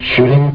Shooting, Eight Ball; Eight Ball Deluxe

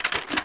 put on

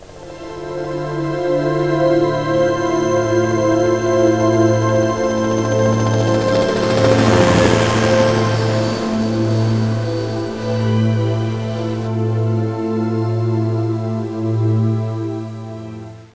HELIC; STRANGE DAYS; cop intro

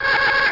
howl; shadow

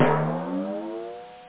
bong; World of Amiga

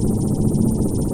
repair bot engine loop

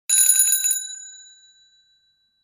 bicycle; WMBonusXP

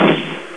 pistol; Total Chaos Nukem 3d Pack; duke

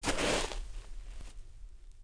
player snow

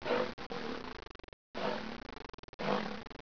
lion; 10,000 Graphics Pack Volume 1; Media Graphics International

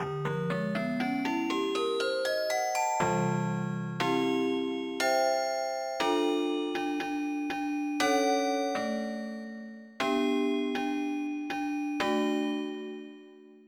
Celesta; sblive

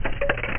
SLOTS

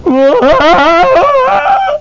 SCARED; carmdemo

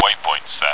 radio; Aminet; Moonbases demo

